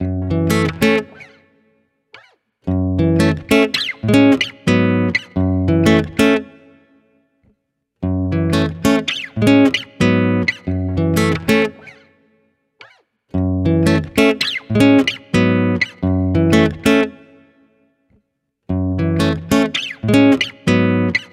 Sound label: Guitar 90 BPM (Gmaj); Guitar Loops; Gula; Ghosthack; Guitar Loops & Shots